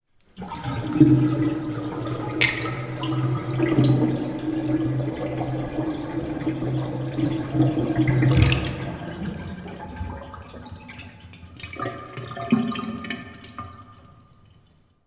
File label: Pulling The Plug, Pulling the plug in a sink full of water in a large space.; Bathroom; Household